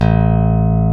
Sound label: BASSES, Roland